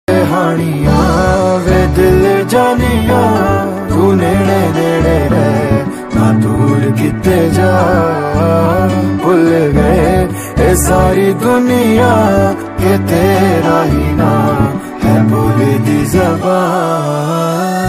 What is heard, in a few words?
punjabi ringtone love ringtone